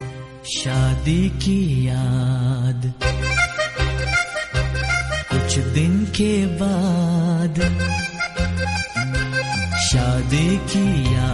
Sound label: hindi